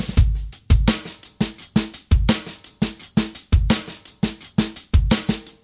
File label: 085 stretchy mushy drums; 081 to 090 bpm